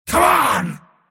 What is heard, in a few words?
attack pain; Dota 2; mars